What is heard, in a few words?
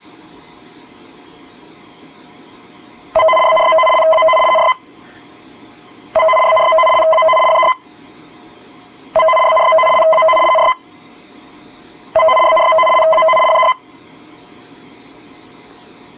EWS TEST SIG